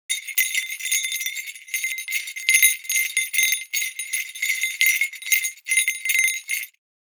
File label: Small toy bell light jingling